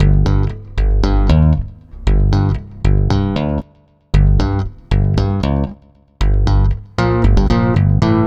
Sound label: Stick Loop, Stick Bass